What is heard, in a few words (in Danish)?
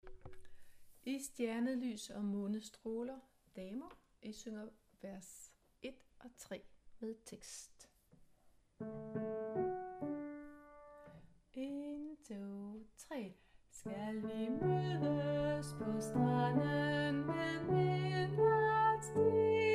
Damer, koret; I stjernelys og månestråler; harlev koret